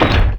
Door Castle

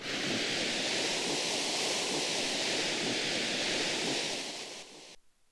Sand

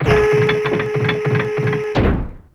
Cannon

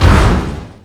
Falling Block

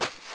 Foot Step Snow; New Super Mario Bros. Wii

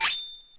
Ice Bros Ice Ball; New Super Mario Bros Wii

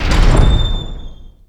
Door

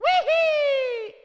"whee hee"; mario; mario kart 64

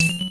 Bullet Bill Collision

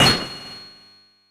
Target Break; Super Smash Bros

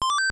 inventory open close; super mario bros 3 nes

